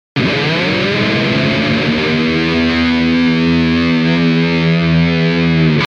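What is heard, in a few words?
Intro Whammy Dip, guitar; Mr. Tabs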